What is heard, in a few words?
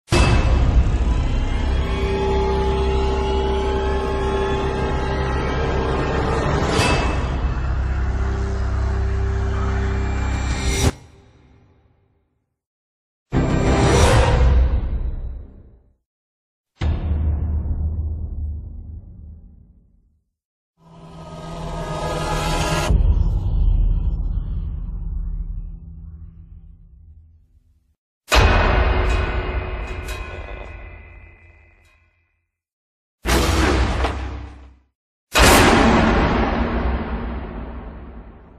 HORROR SOUND EFFECTS AND MUSIC